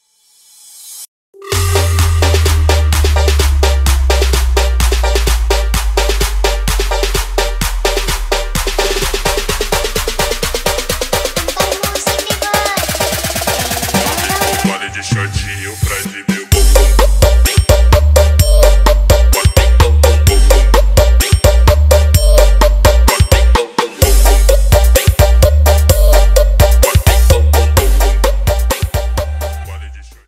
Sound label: boombox; tiktok